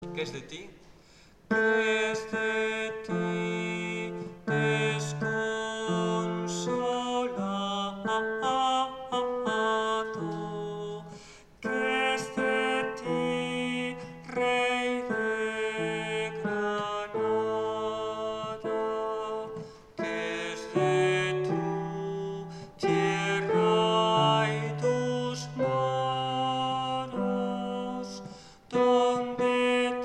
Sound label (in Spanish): Que es de ti soprano